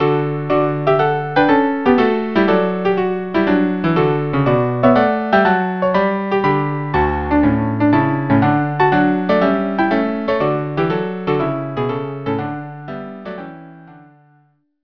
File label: trio; hercules